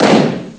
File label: super sdrum; Amiga Soundtracker Sample Packs